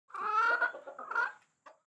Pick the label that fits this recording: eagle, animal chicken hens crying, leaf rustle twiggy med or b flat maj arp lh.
animal chicken hens crying